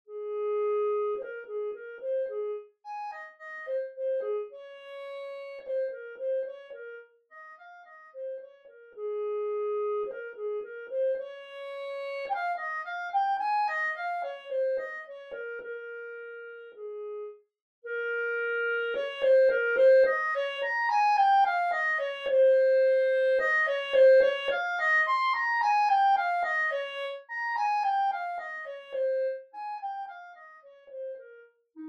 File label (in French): sonatine; beethoven ludwig van